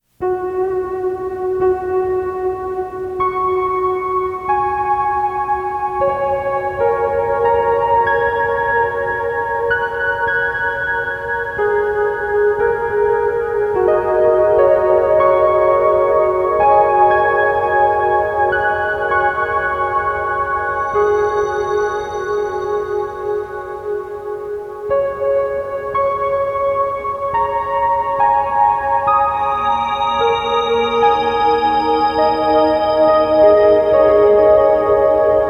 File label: piano ambient